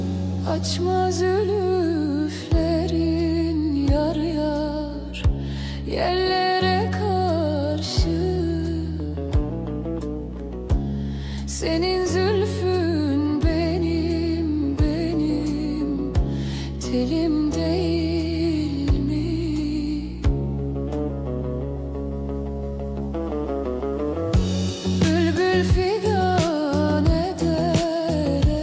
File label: Anatolian Rock 70s Rock Cover